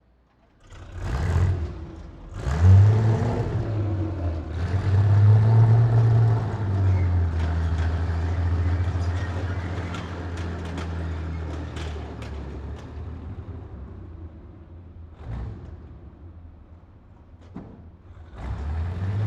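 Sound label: tiger ii exterior slow by stop point exhaust; Pole Position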